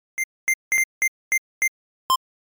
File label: Digital text typing